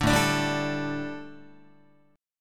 A 7th Suspended 4th, A, down, Guitar

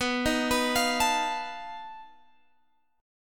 B 7th Flat 5th B Guitar Open G

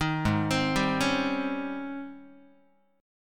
G sharp Major 11th, Guitar; G#M11 Chord

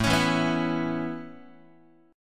A-Major Add 9th Guitar, Aadd9 chord; Aadd9 strummed; JGuitar

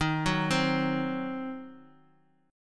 Eb Minor 6th, chord; Ebm6 arpeggiated; JGuitar

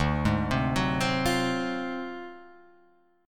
D 7th Sharp 9th Flat 5th, Guitar Open F; 2,3,0,1,3,0; arpeggiated; JGuitar

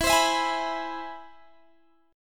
C Augmented Major 7th E

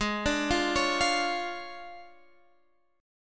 D Minor Major 7th Flat 5th, Ab

